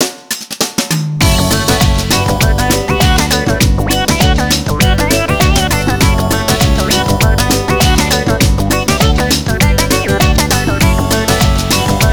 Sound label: Bgm Stepup Normal